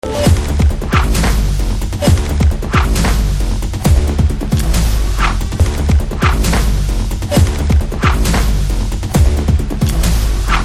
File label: Trailer BGM Ringtone; Lakshya